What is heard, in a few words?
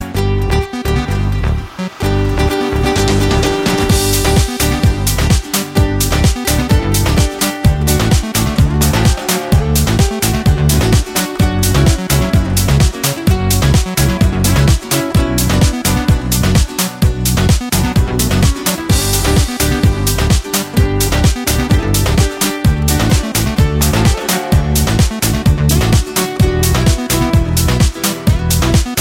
latino dance